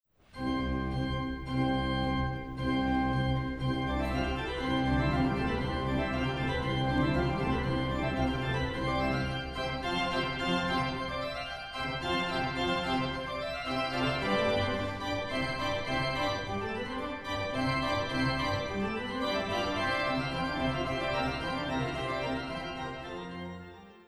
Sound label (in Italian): Concerto del Sigre Vivaldi acommodato per l organo a 2 Clav